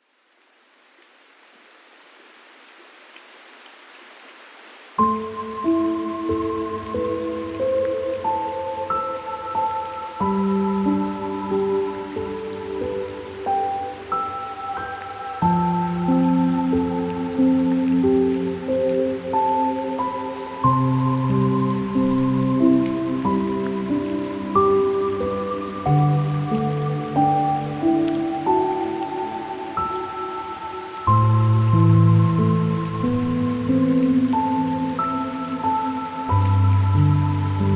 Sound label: Rain Piano